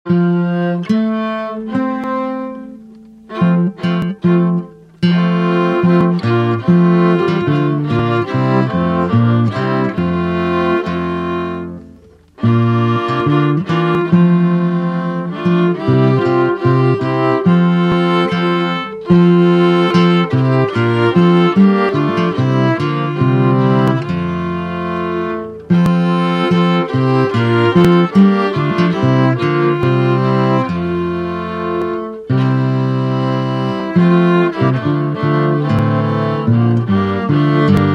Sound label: guitar bow; Quando ritrovo